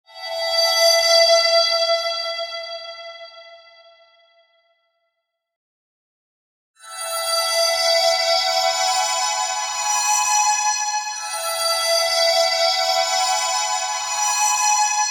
Dizi Chinese Flute; Gorgoroth6669

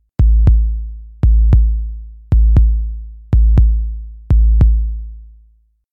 Heartbeat Drum Sound

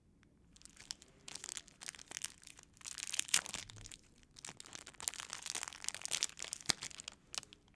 Opening Candy Bar Wrapper

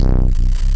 Glitch

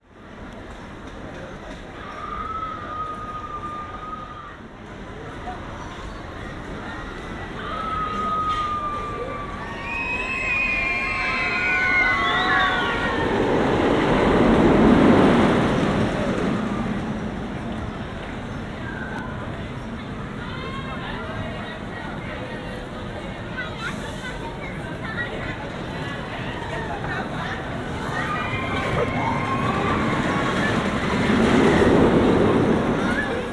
Rollercoaster

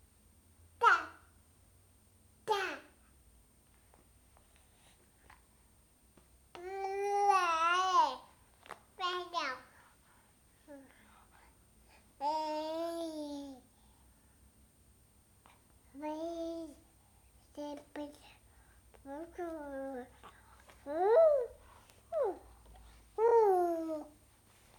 Baby Voice 11 months

